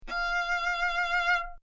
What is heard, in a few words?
instrument